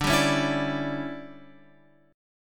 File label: D Major 7th Suspended 2nd Suspended 4th D, Guitar, Open F; DM7sus2sus4 strummed